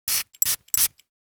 perfume; house; perfume spray; CosmicRage; CosmicRageSounds; added perfume spray sounds

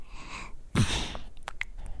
baby yawn; byawn

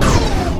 enemy shoot; Cosmic Rage; ships pd

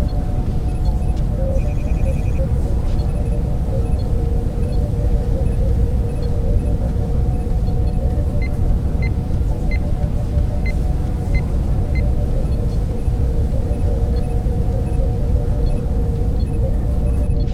teleport, ambiances

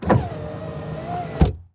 window part 2, vehicle; Cosmic Rage

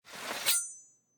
draw, combat, weapons, swingable; default swingable

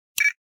switch, ships; CosmicRage

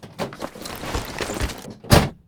exit, farming; CosmicRage

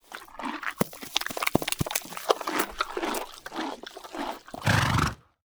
treat, horses; Cosmic Rage

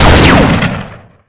gun, enemy combat; general combat

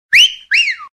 whistle; CosmicRage

